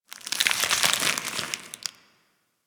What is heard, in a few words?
Ice Crackle Rand Amb